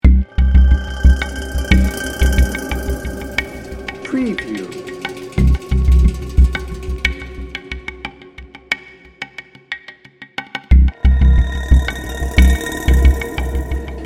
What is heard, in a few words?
disturbing trends no hi synth